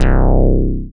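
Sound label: synth analog; Best Service Gigapack 1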